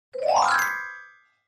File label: Clavichord Ascendin; keyboards